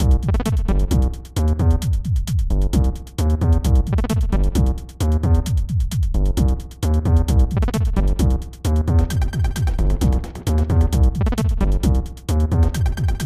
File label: Mixed Match Music